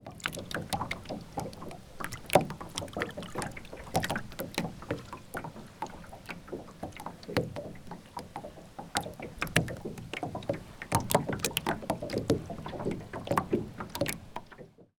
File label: water lapping against boat; transportation